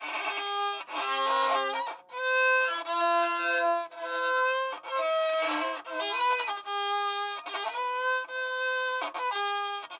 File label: violin practice